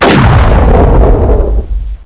slow mo start

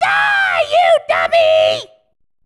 fat mouthbeam; buu

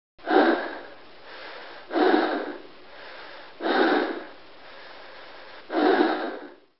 breathe

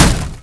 wood, Bullet Impact

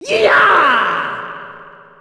scatter beam; krillin

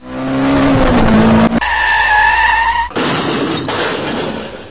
car crash jetta

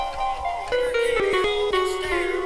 pianos

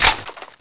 gl slap

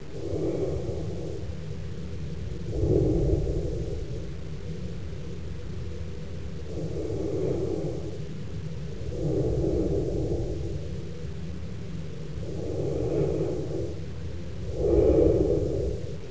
breathing sick

